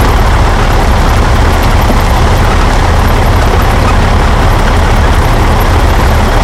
truck movement loop

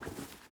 lr300 deploy; Rust

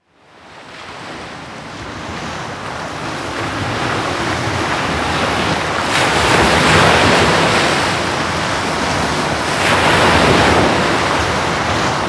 oceanwaves

